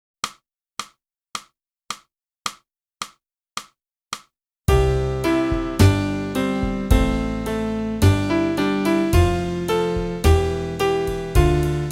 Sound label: flute; Boosey Woodwind Method: Flute (Book 1)